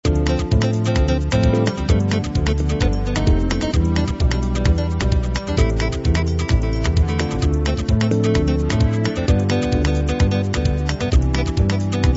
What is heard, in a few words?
bossa samba